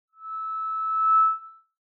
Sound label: Megaphone Feedback Dry